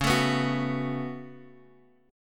C sharp 7th Suspended 4th Sharp 5th C sharp, Guitar Half Step Down